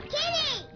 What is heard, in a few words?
kitty phone sound